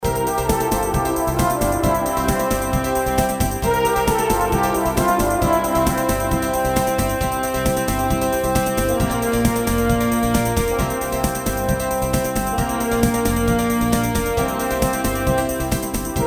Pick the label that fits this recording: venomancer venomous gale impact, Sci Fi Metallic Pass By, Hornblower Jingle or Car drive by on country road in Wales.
Hornblower Jingle